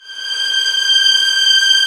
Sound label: STR, Vlns; Roland L-CD702